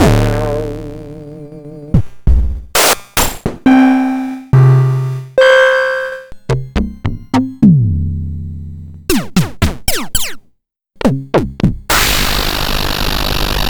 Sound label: Electron Sidstation